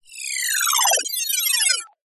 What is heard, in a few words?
science fiction robotic cyberpunk electronic equipment beep power; zapsplat